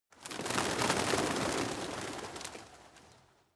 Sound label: pigeons flock rise soft; natur-tiere; voegel